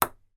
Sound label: sport table tennis ping pong ball bounce hit table